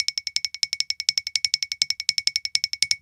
TIPTOE, CARTOON; 1000fx

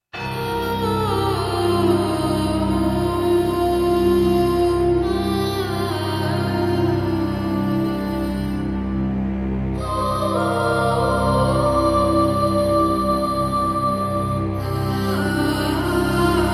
Requiem (instrumental)

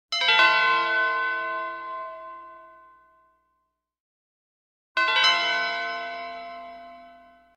Music effect - Quick arpeggiated tube bell; Hollywood Edge Sound Designers Tool Kit

